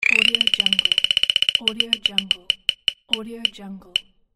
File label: wheel of fortune